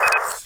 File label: Rocket End, weapons; Dystopia